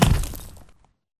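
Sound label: Bullet Light Ballistic Dirt impact; Titanfall 2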